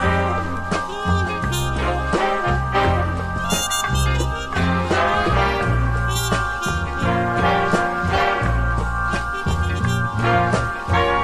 horns, jazz loops

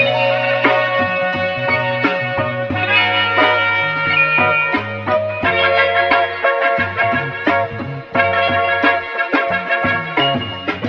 horns, Loops, Phonky

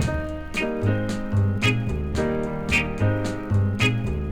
piano, loops, phonky

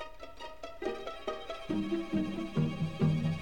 strings, Sad

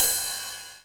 Real Open Hats

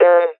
bonk

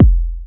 chest thump; Medium

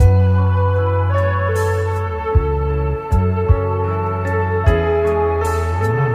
keys, loops, phonky

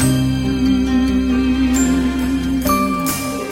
piano, Loops Sad

